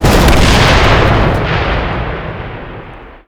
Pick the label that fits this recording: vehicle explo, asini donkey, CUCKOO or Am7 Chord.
vehicle explo